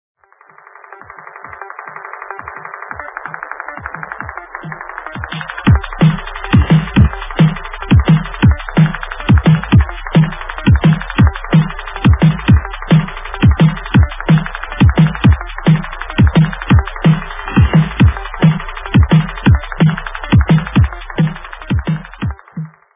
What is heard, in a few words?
BEAT DRIFT